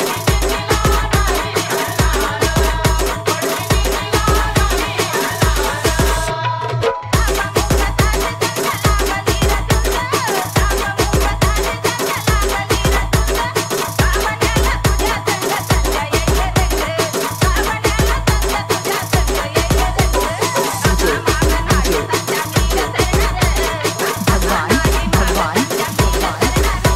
Marathi Dj Ringtone

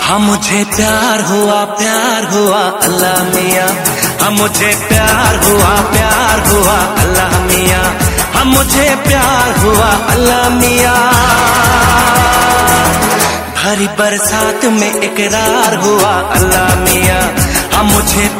Ringtone Male Version

